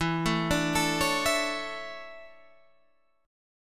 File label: E 13th Suspended 4th